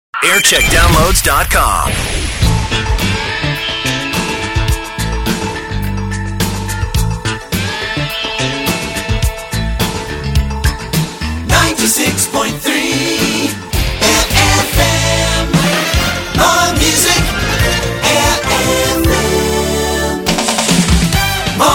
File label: Jingle Montage; Aire FM; JAM